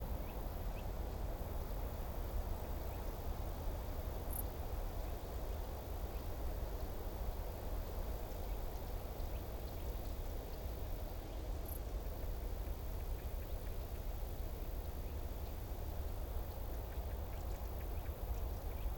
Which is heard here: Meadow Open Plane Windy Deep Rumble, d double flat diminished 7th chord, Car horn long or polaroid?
Meadow Open Plane Windy Deep Rumble